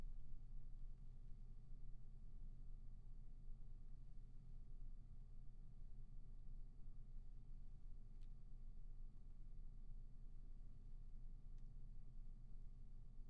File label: room tone